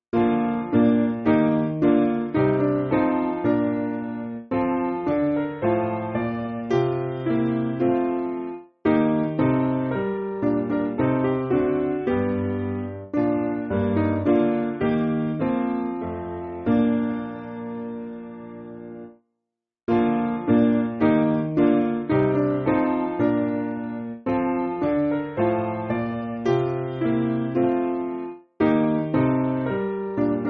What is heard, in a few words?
Piano, church music; Come Lord To Our Souls; Veni Domine